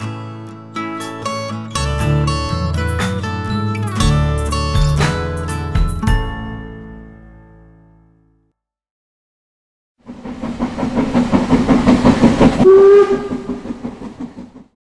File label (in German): Lokomotive; Papperlapapp; HABA Play